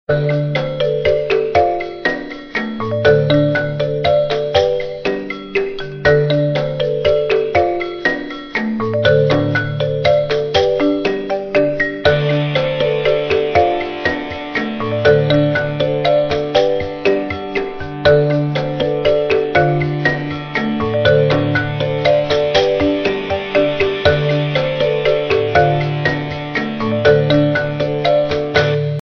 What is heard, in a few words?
Asian Marimba